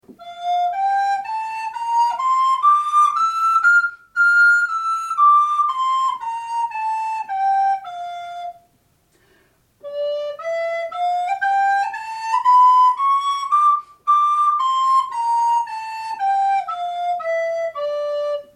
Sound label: gammes F Dm, FLUTE